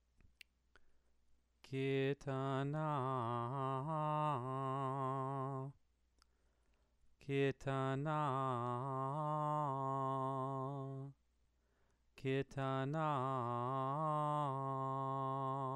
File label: Megilat Esther exercise